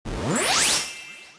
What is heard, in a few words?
morph; Amatic; Lucky Little Devil